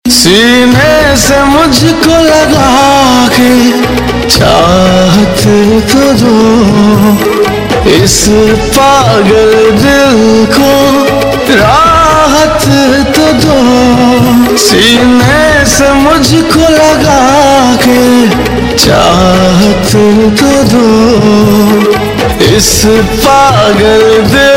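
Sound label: Ringtone, Bollywood Punjabi Pop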